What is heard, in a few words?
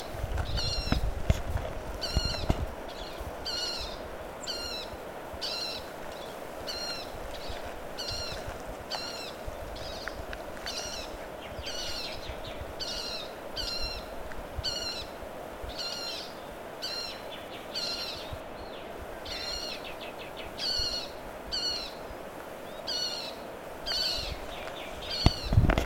Yellow faced parrotlet